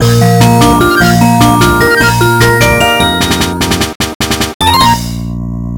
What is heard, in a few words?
Level Success